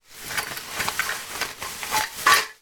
household christmas decorations in plastic bag movement